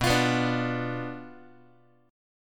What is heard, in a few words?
B Diminished B Guitar; Bdim strummed; x,7,8,9,6,x; JGuitar